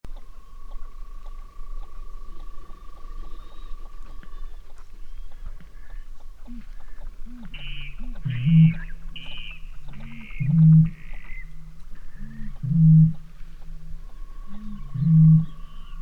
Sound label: Eurasian Bittern Botaurus stellaris, song, Stary Talap, Kazakhstan